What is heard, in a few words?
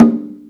gedombak ibu ting